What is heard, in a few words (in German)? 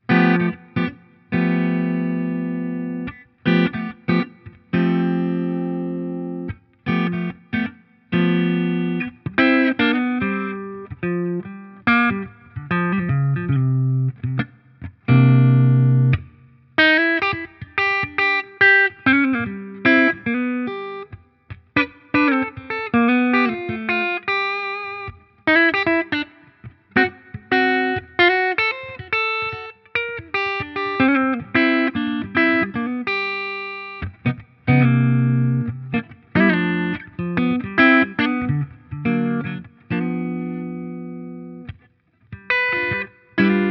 Harley Benton Guitar Kit Hals clean